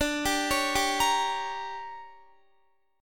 D Major 7th Suspended 4th Sharp 5th, D, down, Guitar; arpeggiated; 4E,4A,5D,5G,5C,6F; chord